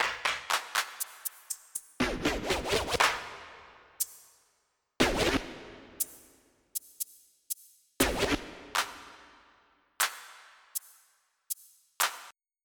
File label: Dance Percussion; Onj's Soundfont